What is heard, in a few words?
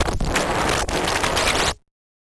Casio, Glitched, malfunctioning synth